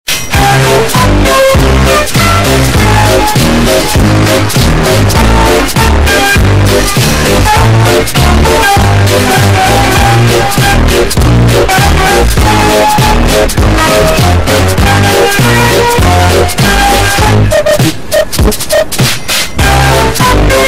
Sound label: midi Version